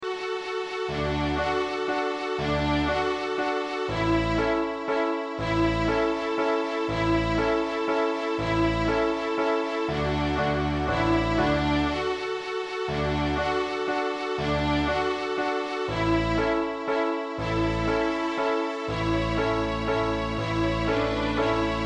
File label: FIDDLE DIDDLE